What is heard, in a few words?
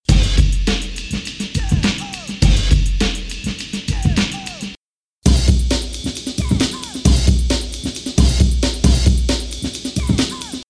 Beat